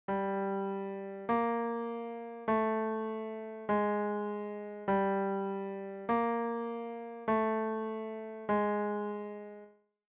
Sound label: Accompaniment